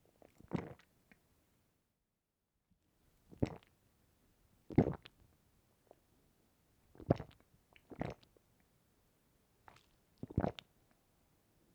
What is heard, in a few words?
swallowing gulping; Lewd SFX